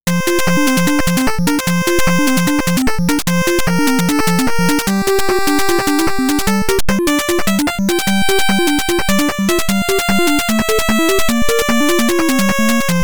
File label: in the wind